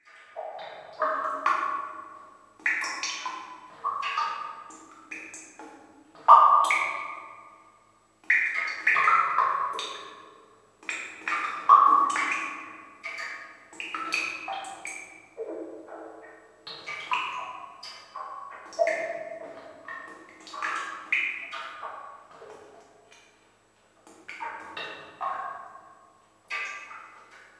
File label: Ambience Caves; Marlin's Cave